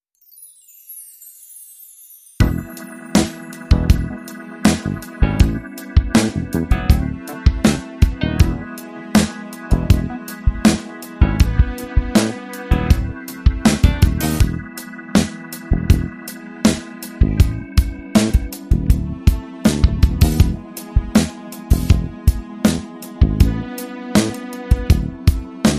Piano Bar Regulars

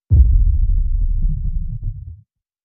hit

